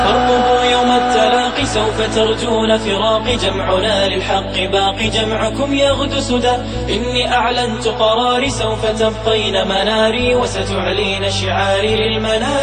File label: Arabic Nasheed